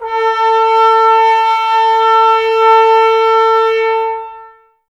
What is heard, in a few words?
BRS TBSA, Brass, Tbs Mute; Miroslav Vitous - Woodwind Brass Ensemble